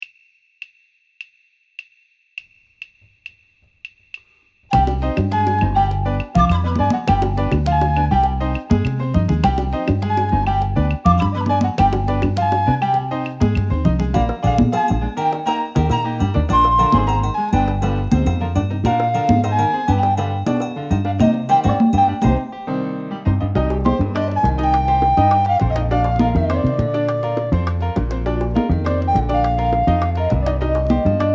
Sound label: Salsa Alto